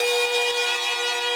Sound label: synth main